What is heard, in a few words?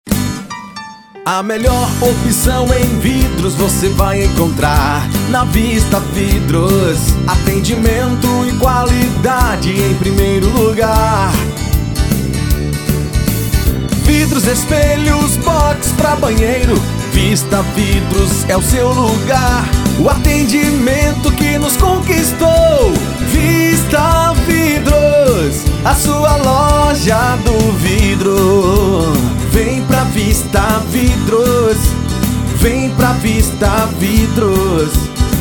jingle; vista vidros